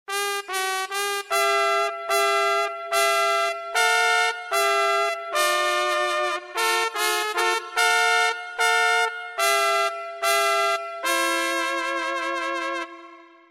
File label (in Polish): trąbka